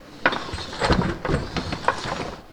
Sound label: Press Machine with Feeder; loop mono; MACHINES & MECHANICS; Universal Sound FX